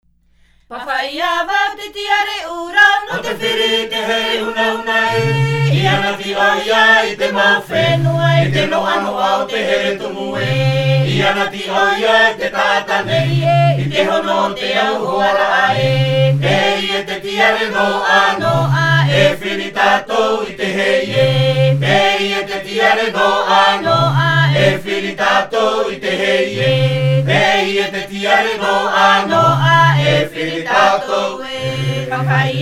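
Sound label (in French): Himene tarava Tahiti, chants polyphoniques maohi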